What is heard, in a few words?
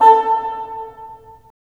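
WND BN, wind bassoon; LA Composer vol. 3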